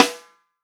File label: FUNK SNARE CHROMATIC, REAL DRUMS